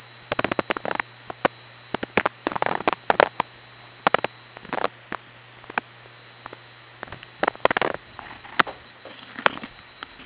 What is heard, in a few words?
a 44 Khz tone signal monitoring movement; ultrasound tone monitoring movement; The above tone at "44Khz" thought best for subliminal purposes